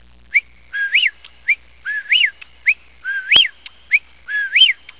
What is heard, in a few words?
whippoorwill